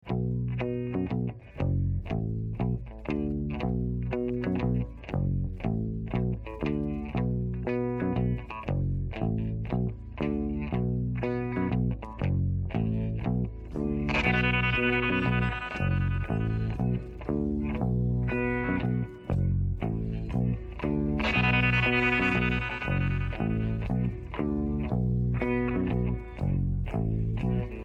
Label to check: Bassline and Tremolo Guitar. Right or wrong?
Right